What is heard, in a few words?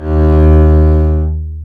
STR ARCBS, Cb Bowed, mf vb; String Master Series